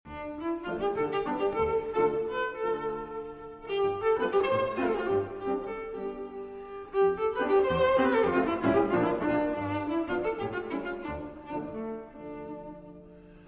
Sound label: Romanian Folk Dances, Sash Dance; Six Romanian Folk Dances, BB 68, Sz. 56; Arr. Székely Transcr. Maisky